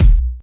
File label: sharp kickdrum sound D# key, Royality free bass drum single shot tuned to the D# note; kick drum samples